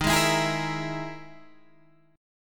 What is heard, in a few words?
E Major Sharp 11th D sharp